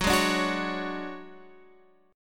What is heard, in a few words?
D Major 7th Flat 5th F sharp, chord